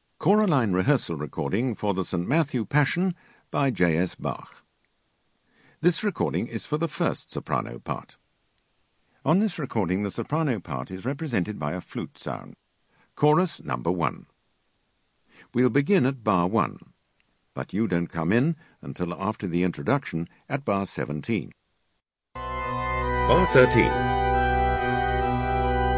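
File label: Sop1, soprano 1; Bach Matt Pass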